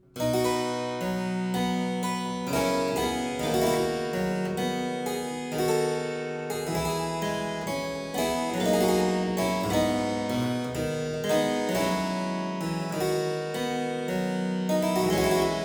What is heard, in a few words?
Suite in C major Sarabande II, Harpsichord; Buxtehude; Complete Harpsichord Music